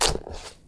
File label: fungus footstep; trees; dfcaverns